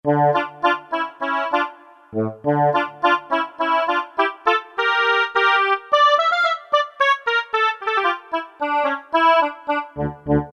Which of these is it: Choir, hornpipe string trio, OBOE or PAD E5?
OBOE